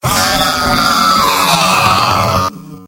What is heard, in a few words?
engineer pain critical death; tf2; mvm